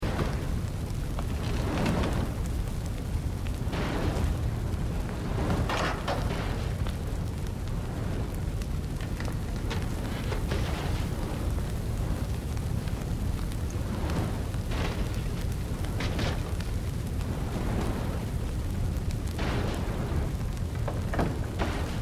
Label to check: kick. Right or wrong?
Wrong